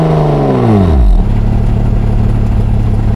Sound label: atv throttle off slow loop; hl2